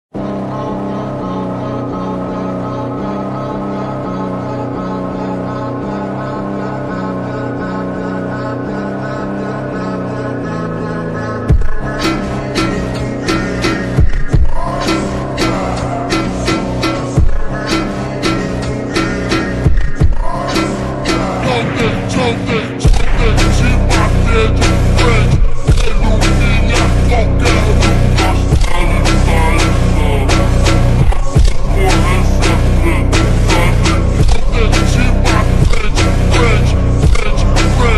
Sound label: brazilian phonk super slowed reverb